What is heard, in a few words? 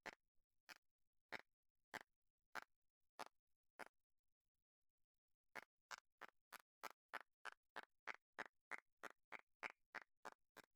gallery indirect